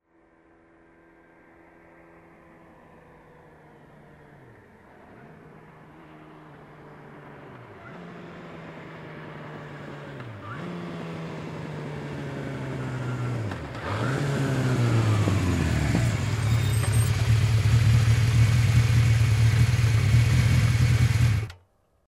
Motorcycle approaching, turning off

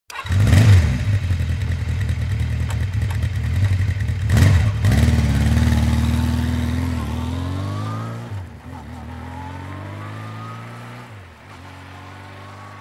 Motorcycle starts, waits, drives away

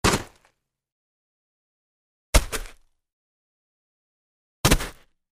Throw snowballs

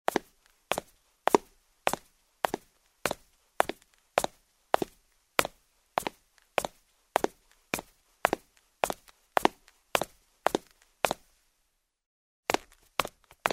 Steps on the marble staircase in shoes

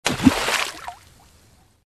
Stone falls into the water

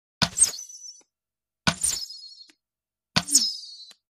Crossbow, the arrow flies by; cold weapons

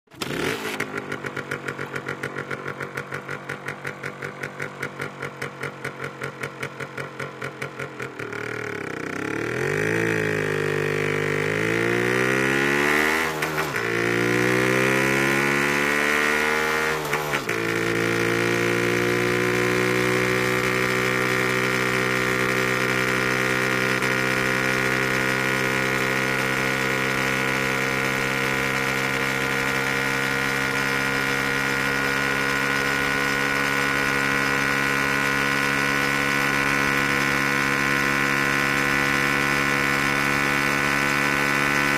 Motorcycle starts, moves, turns off

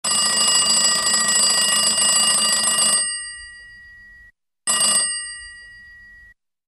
Old phone call; telephone

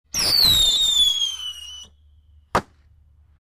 Fireworks shot, weak explosion; explosions

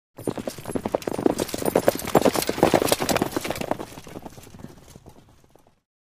The horse gallops on the ground

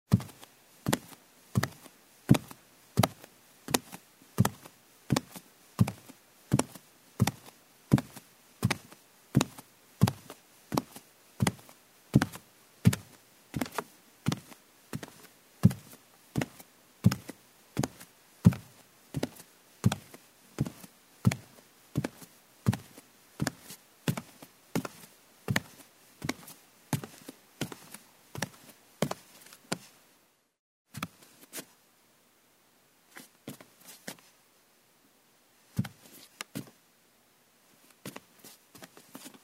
Barefoot steps on a wooden floor

